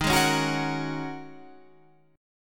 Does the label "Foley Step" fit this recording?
No